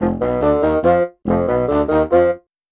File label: Horns; Sonic Foundry; Cinematic Funk Urban Tone Poems; Master Zorn